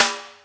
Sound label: Regg S, Boss DR660 sd